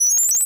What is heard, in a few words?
Science Fiction Sci-Fi Electronic Readout